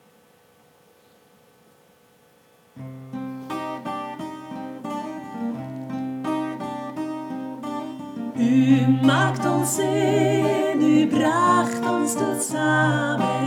tutti soprano guitar

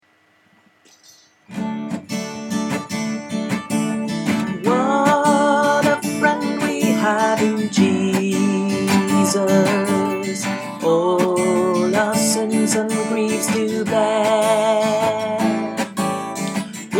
what a friend tenor

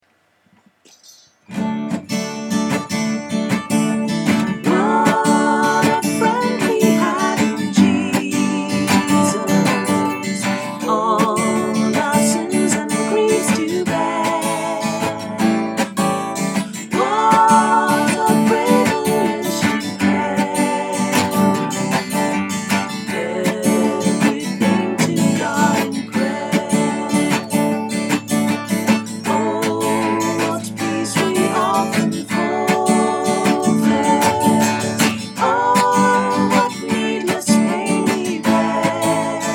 tutti

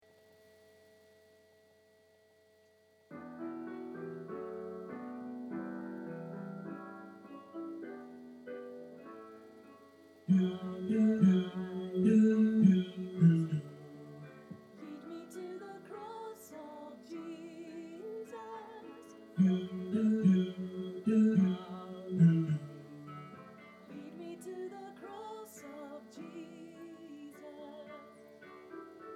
bass

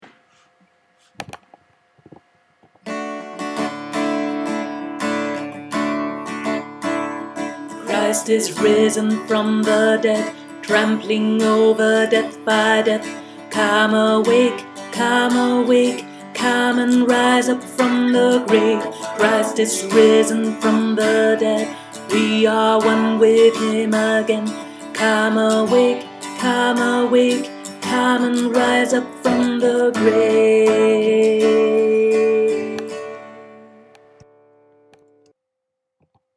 christ is risen tenor

